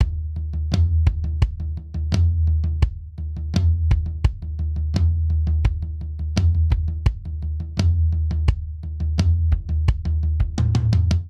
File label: Toms Pop Intro 85 bpm; 4 4; MIDI Clips; Ableton; Factory Packs; Drum Booth